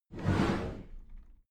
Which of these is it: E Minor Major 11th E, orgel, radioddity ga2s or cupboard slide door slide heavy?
cupboard slide door slide heavy